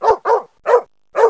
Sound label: dog